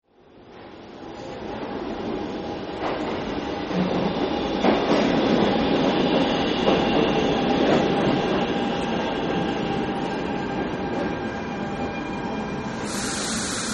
Subway Arrives; Subways; Sound Effects:Vehicles

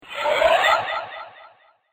Magical Portal Appears; Magic Sound Effects; fantasy sound effect; role playing games, magic videogames

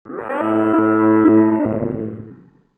Faulty Industrial Sf Laser Beam; Laser Sound; science fiction sound effect; Andrew Fleming

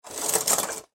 Household Shower Curtain Open Fast, bathroom; Bathroom Sound Effects; Bathroom (Sound Effects:Household); SFX Bible 6

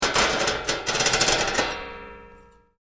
Metal Drag Scrap Clanking; Metal rattles from dragging a metal bar across metal ridges; Clank Sound Effects; Lynne Music

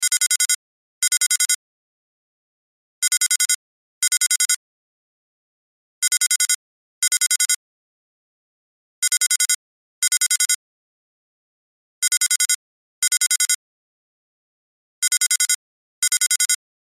Cell Ringtone; Loop; Cell Phones; Blastwave

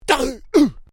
Male Vocalization "Hun Cha"; Male vocal sounds; Exclamations; Voice

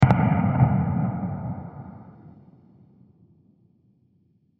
Large And Diffuse Explosion; Explosions (Sound Effects); CraftedSignals

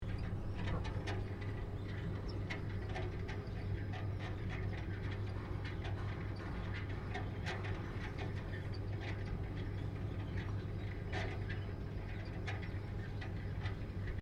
Vetilator Rotary Roof Mounted Loop; Various old or antique devices; Machinery Sound Effects; Machinery (Sound Effects:Machines)

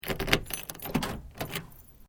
CAR SUBARU IMPRESA DOOR KEY LOCK; Hire Car in japan

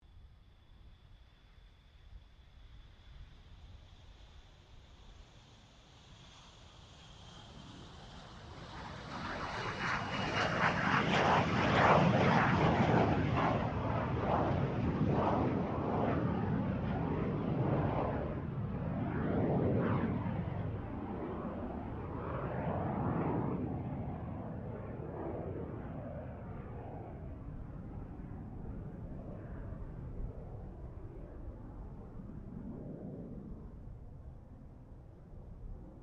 AIRCRAFT DH-115 VAMPIRE T.35 TAKEOFF, Fighter Jet; 1946; Airplanes; Vehicles; Aircraft Collection

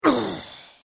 Male Grunt Human Injury Pain Vocal Ugh Ouch; Grunt Sound Effects

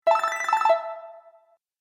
Bright Sound Video Game; Video Game Sound Effects

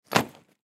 Lacrosse Equipment; Bag Set Down Cement; Sports

